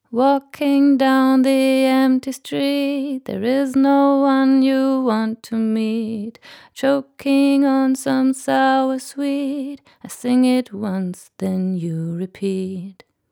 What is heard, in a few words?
Rode NT1 Vocal Studer 962 EQ